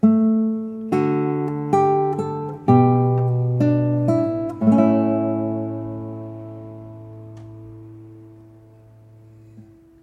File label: guitar tutti